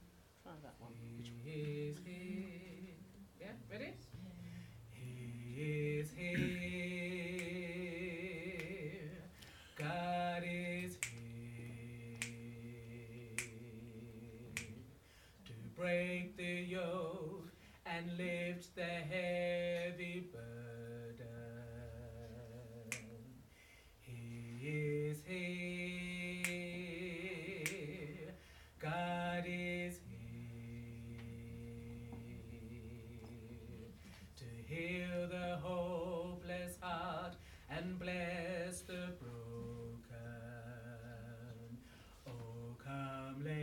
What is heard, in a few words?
There Is a Sweet Anointing bass